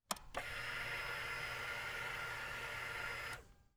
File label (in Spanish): CONTACTO YBR, YBR125